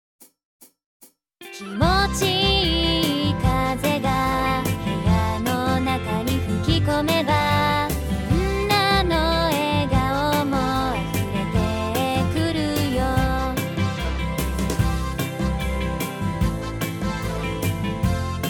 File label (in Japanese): 仮 Vocal